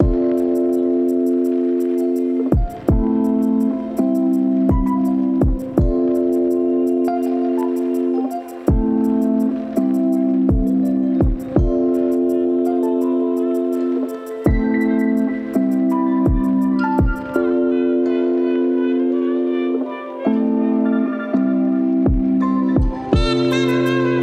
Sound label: Piano Lessons, Lo-Fi